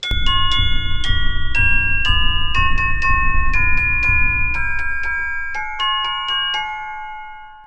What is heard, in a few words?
Celesta Sound Clip